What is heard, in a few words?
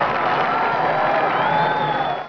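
APPLAUSE, Crowd; Rosegarden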